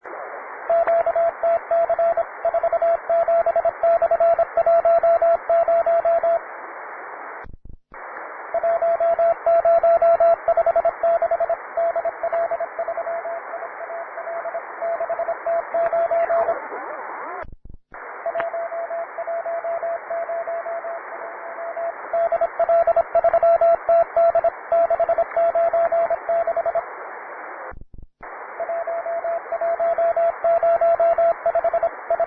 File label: cw, QTC list; Training CW QTCs (Advanced)